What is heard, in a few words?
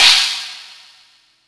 E Chinese Cymbal, Yamaha PSR E305